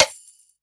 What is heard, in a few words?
snare; dough-samples; eddyflux